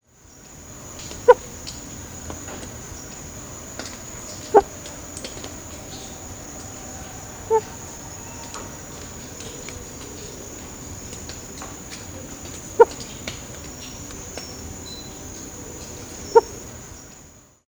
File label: Eleitherodactylus zeus